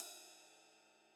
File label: hi ride, gretsch kit